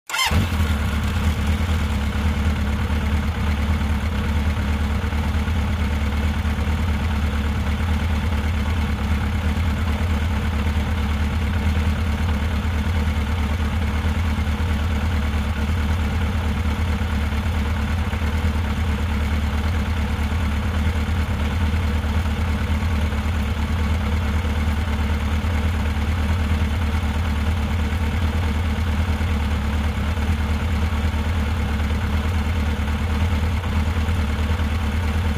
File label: Motorcycle starts, waits, turns off